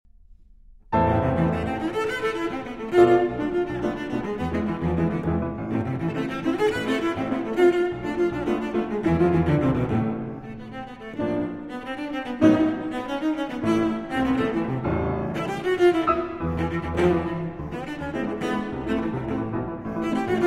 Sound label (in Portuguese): Violoncelo e Piano Baião; Suíte Brasileira